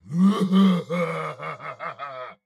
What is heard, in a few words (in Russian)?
Ха-ха-ха; Турбосвин